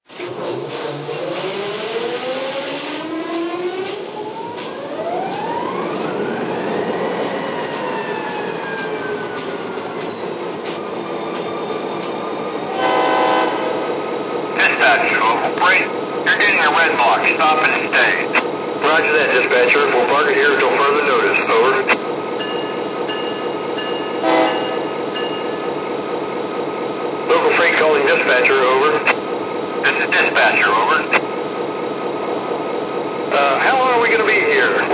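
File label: SD45, locomotive; N&W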